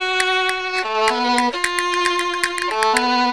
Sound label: Castanets Violin; WSOLA; TSMtoolbox